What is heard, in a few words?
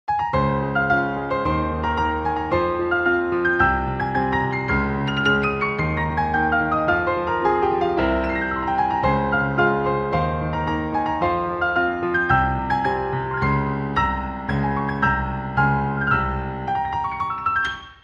piano solo ver.